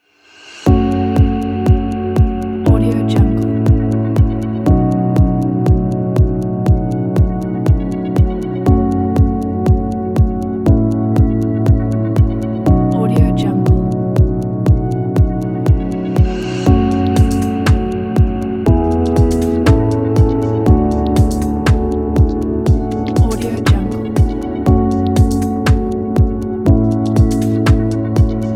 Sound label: timelapse music